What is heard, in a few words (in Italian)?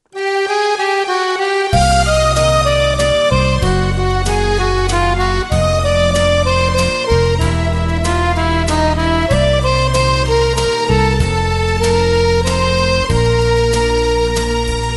Valzer Lento